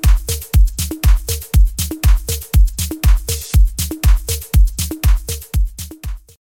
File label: parallel saturation mix